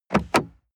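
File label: sports car interior glove compartment open short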